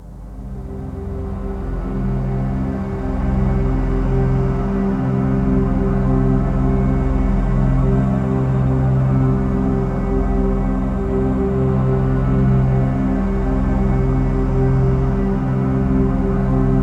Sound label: ATMOPAD; Best Service; XX Large; Pads